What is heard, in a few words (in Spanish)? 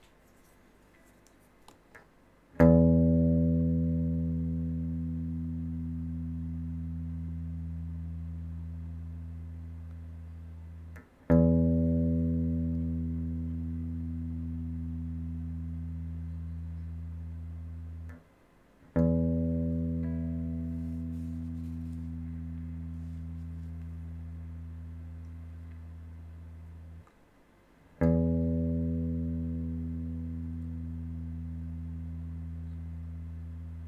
Sonido de guitarra eléctrica cuerda 6 Mi E; afinar una guitarra